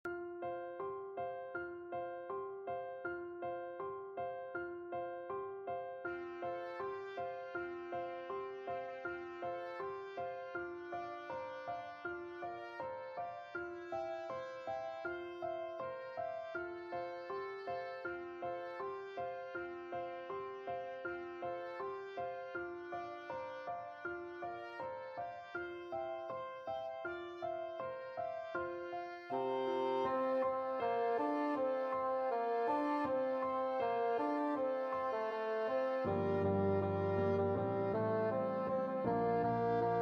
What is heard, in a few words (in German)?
Bass langsam